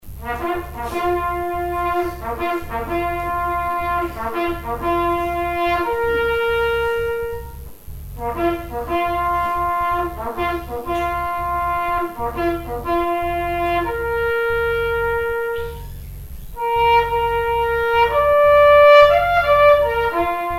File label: reveille; remembrance; The bugler then struck up the Reveille, ending the silence.